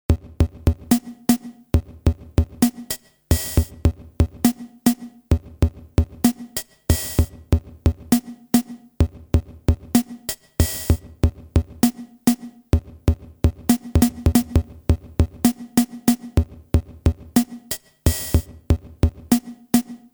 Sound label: drums; gombulator